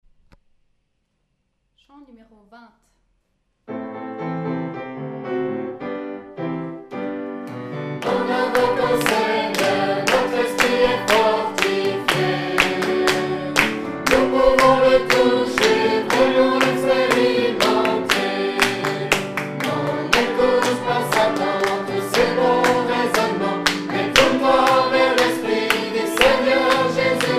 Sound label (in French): Chant; FR